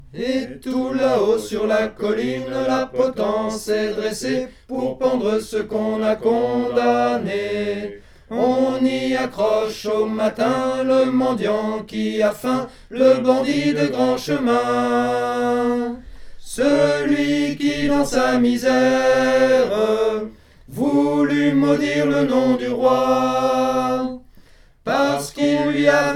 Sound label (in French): tenors, voix; Archers du roi